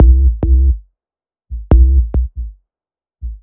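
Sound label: Bass drum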